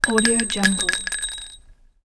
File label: rounds metal drop onto smooth tile floor; game sounds; افتادن قطعه فلزی رو کاشی